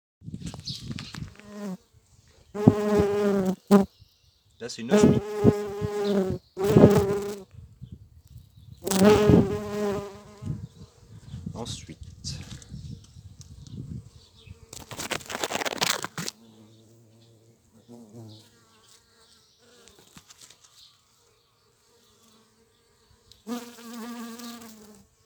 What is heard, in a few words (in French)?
Abeilles et osmie